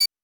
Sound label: c4 beep loop; Rust